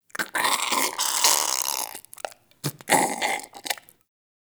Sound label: Goop Squeeze Close; Ambience; Warframe; Infested Eris